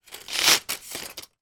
industrial tool tape measure pull short length out